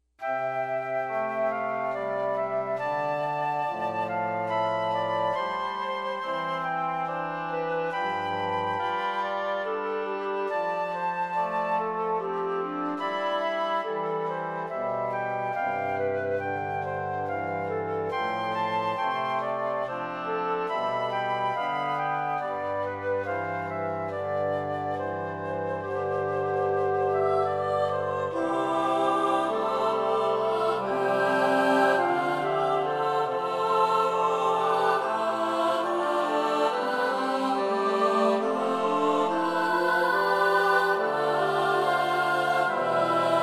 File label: hymn with organ; Marys hymn